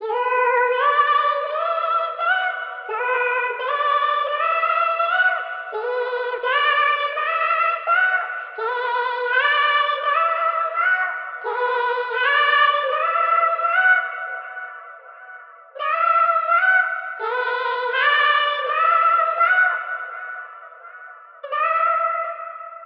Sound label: Vocal Chop Loop - 168 BPM B Min; Champagne; Cymatics; Mystery Pack Vol 4; Vocal Loops